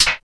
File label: CYBER KIT, DRUMS